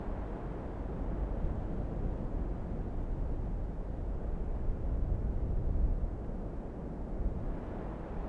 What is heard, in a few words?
desert light wind gusts; ambient; Counter-Strike Global Offensive